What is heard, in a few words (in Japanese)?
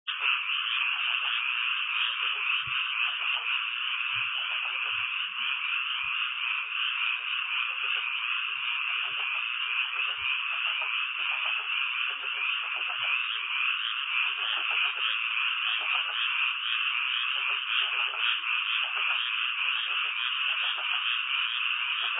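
kaeru, frog; 田圃に水が張られるとどこからか蛙が現れて合唱を始めます。ついでに家の隣の田圃での音声です。; 蛙の合唱です。; 標高750ｍ〜850ｍぐらいの田園地帯（里山）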